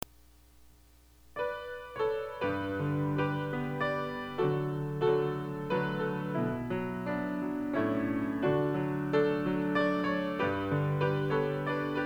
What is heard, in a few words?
Special Music